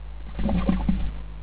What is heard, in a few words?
toilet